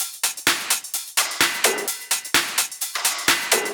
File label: Loops 128BPM, Drumloops; Vengeance - Essential Clubsounds Vol.5